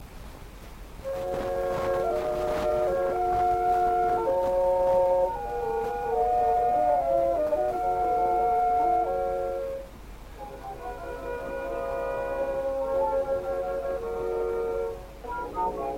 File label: Gramophone